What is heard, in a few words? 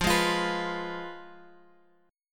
F Diminished Guitar Open G